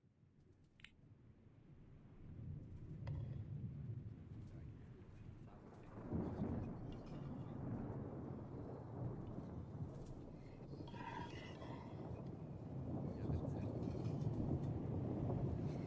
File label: Lab Soundscape; Oribotics [lab]; Asialink Centre